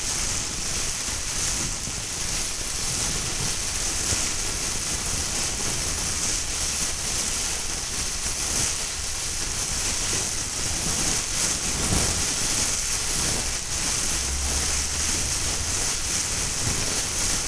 bag

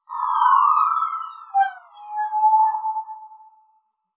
Siren

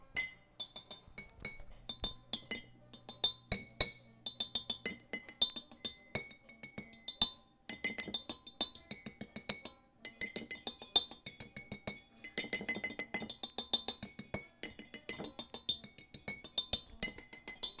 Bottle Taps source; Walas

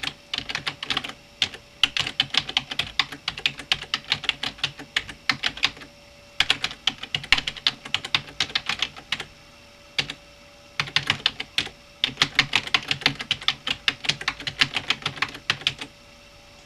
keyboard

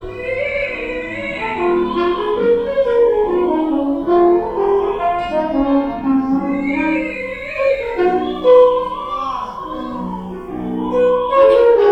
Jazz horn

